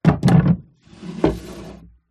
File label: Open Drawer, Old, Wooden, Movement, Drag; PMSFX